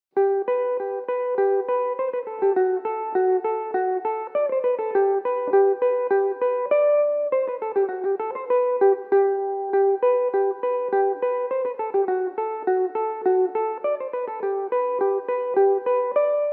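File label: G major, Irish mandolin; rakes of mallow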